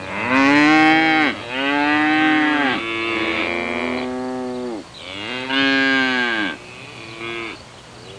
Mooing cows

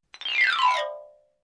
Xylophone descending scale

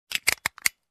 Camera Setting Control

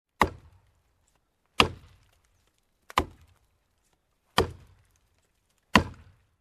Chopping Wood

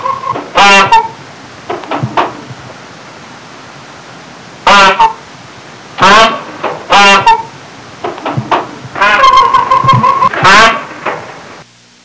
Peacock honking

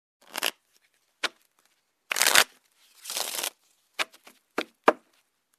Play Cards Shuffling

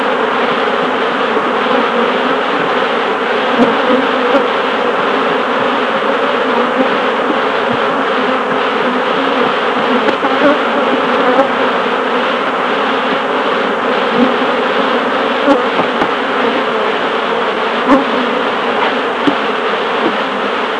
Beehive ambience

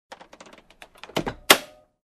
Aircraft Crew Storage Cabinet

